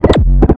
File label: dog phrase; hl2 ep1; npc, dog